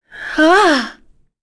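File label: Vox Attack, English; Lorraine; kings raid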